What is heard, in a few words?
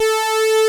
Taurus 2 lead, Moog Taurus; Greytsounds VOL-1